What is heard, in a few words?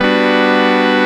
CHORD, 160 BPM, Drum & Bass; Ueberschall; Drum & Bass Flash Trackz